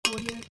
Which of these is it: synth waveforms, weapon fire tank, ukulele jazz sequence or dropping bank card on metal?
dropping bank card on metal